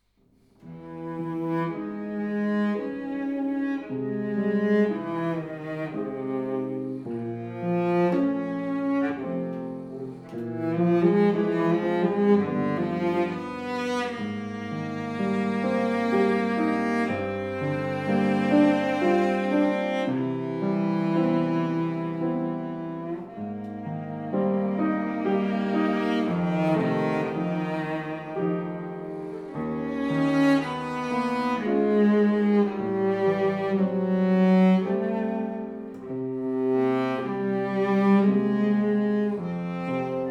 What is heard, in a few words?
pianoforte; violoncell